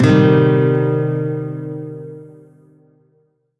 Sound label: Shawzin Min Chord A; Music; Warframe; Lotus Shawzin